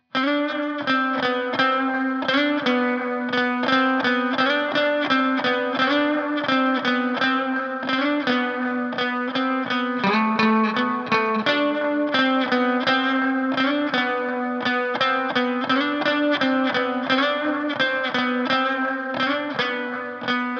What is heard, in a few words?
85 BPM C Min Lead Guitar; Loop Stems; Cymatics; Miami Guitar Pack - Pt 2; Rust